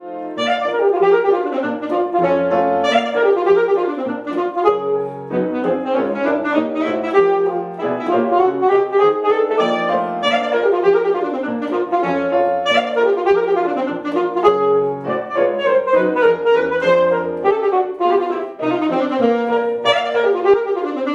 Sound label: Sax